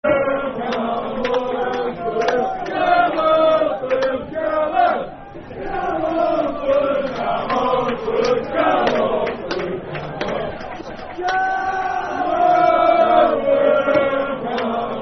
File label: Come On Fulham, Football Chant (Soccer Songs)